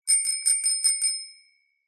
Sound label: bicycle bell ring; vehicles; zapsplat